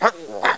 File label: corner climb; Ferazel's Wand Demo